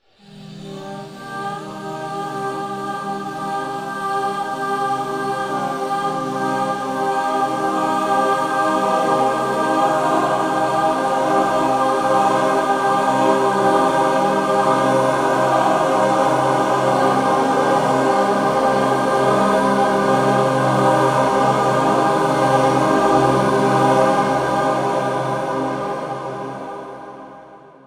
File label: Granular Vocals - Ghostly - Cm 60Bpm; Granular Vocals FX; Ghosthack; SkyRealm